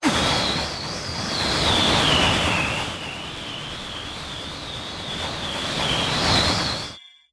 Gale Boomerang Throw Long

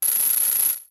Clawshot Extend

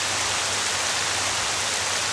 Rain

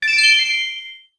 Get Rupee Yellow

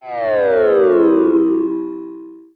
Magic Armor Drained

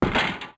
Magic Armor Step

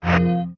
Item Menu Open

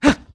Side Jump; Link

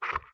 Wolf Huff; Wolf Link

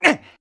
Throw; Link

